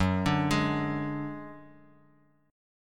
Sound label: Gb Suspended 2nd Sharp 5th Guitar Open D